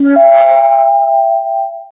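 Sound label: warn tone; warning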